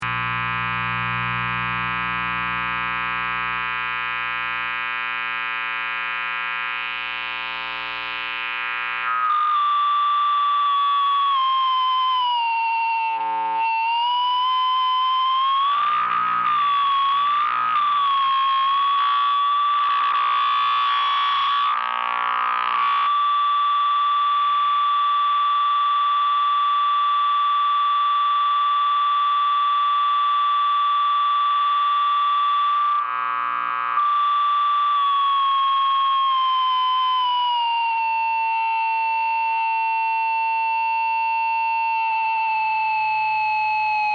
Amp Noise Static Feedback, Electricity, hum and buzz, then high pitch; Noise Sound Effects